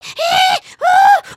freak out, emotes

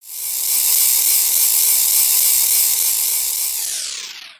Jail Cage Skull Spins; gnarled forest

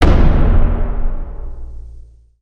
Troll Drum Long

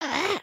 headspin, emotes

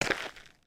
Foot Dirt Sneaker Walk, footsteps

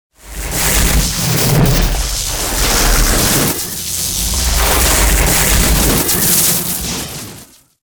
Electrical Storming

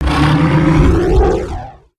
Scratch roar; Summoner

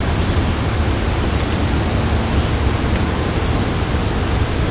wind

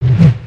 Tail Whip; creatures organic

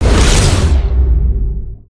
cannon shoot

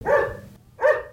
Pet Terrier Bark; creatures pets

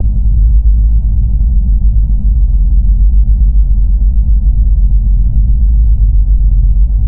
Engine; ambience; Corner; nexus tower

